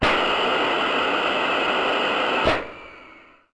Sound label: jet